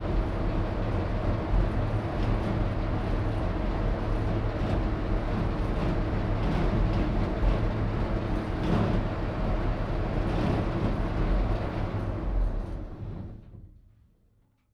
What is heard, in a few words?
Panther, Turret, Rotate, Off, Interior, Driver; Ausf. A 1943